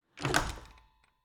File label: Cabin, front door, split door, oak, large, iron fittings, close, hyper; Eiravaein Works; Vaeyan IV